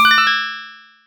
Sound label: coin burst